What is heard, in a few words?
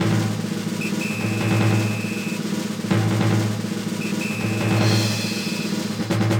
circus drum; Bgm cutscene